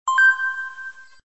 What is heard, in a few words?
ding; santa cannon